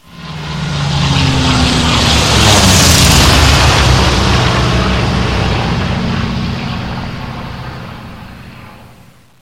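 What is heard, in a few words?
sound of plane flying away into distance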